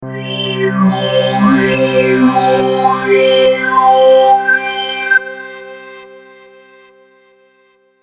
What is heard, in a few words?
Audio Logo Evolving, sonic logo